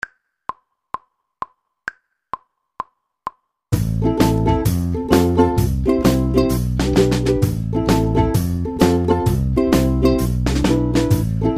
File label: ukulele